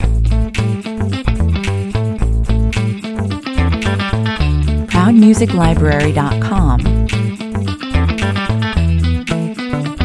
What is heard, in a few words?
loop